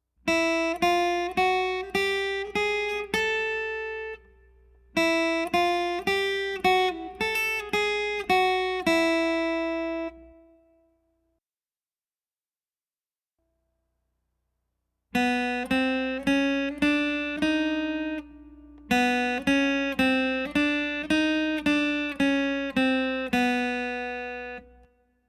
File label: Flats 1st And 2nd Strings